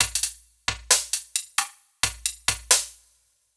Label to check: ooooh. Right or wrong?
Wrong